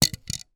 childs sunglasses drop on carpet floor

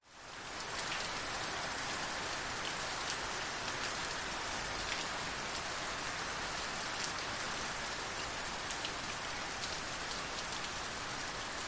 nature rain large thunder rumble drips porch; zapsplat

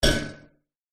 household fan desk metal basket cover bash; zapsplat

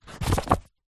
book thick paperback cover page turn; household; zapsplat

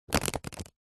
foley card pile of beer mats stack

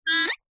leisure toy squeaky squeak broken

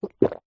human swallow gulp drink single; zapsplat

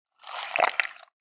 horror bloody organ removed from plastic bowl; sound effects one

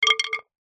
impacts wood small pole drop

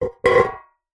tin metal cylinder lid on set down on concrete

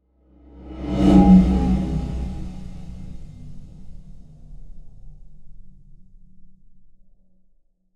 Transitions; soundbits

